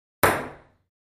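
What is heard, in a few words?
Ping Pong Single Ball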